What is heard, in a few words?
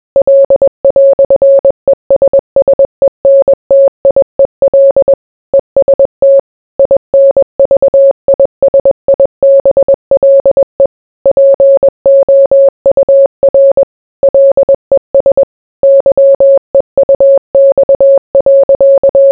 morse